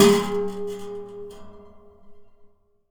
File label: Classical Guitar Buzz Pluck; Classical Guitar Extended Technique Stereo